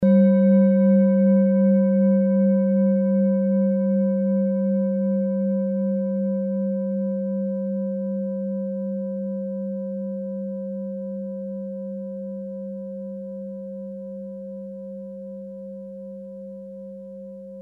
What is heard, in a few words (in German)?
klangschale orissa; Die Klangschale kommt aus einer Schmiede